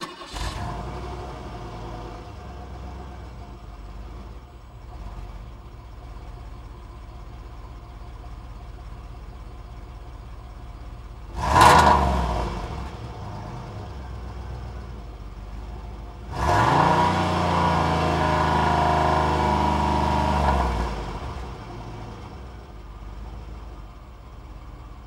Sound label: Cadillac 1990 Exterior Stationary Revs, Short, Long, Rear